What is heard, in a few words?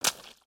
Foot Swamp Water Run, footsteps